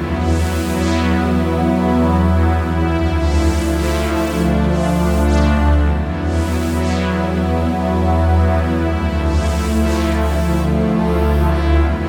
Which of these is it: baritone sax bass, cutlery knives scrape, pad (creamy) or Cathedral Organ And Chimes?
pad (creamy)